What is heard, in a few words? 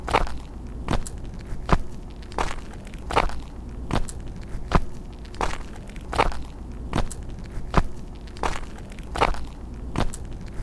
walking at night; human